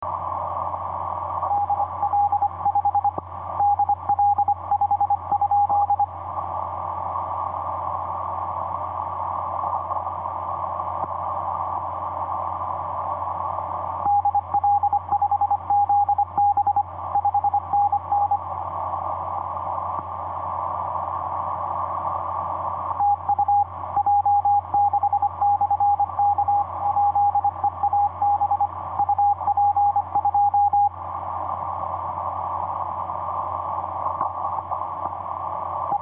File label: 30m cw; j6-k8eab; dl5zb